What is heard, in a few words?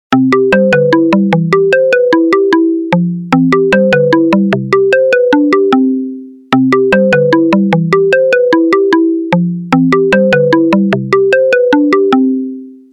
Kalimba Dance, ringtone